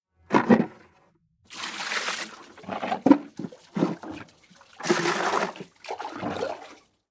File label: Diaper laundry; anemoi